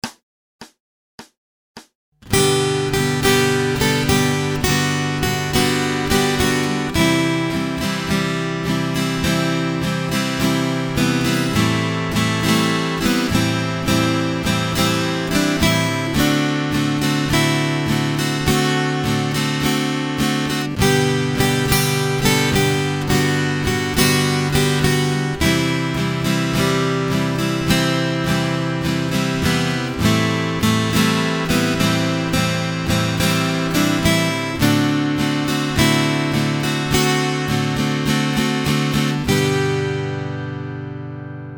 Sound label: Acoustic Accompaniment, acoustic guitar; Grade 1